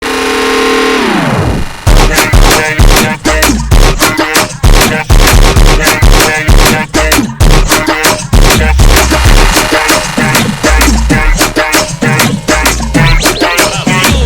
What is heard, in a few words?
brazilian phonk